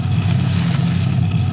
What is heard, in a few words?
Pushables Grass Move; Playable Tutorial Level